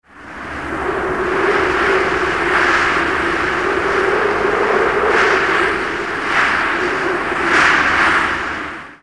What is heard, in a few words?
Tropical Storm; Tropical Rainforest